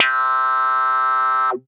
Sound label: Bass, Percussive